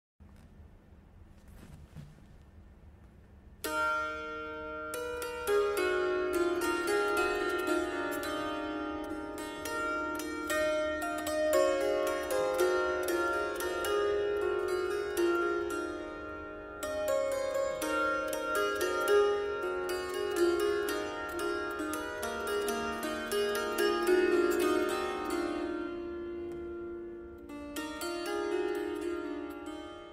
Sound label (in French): psalterion; pincees